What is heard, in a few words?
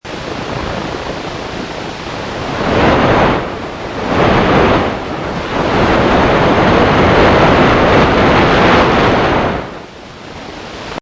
leak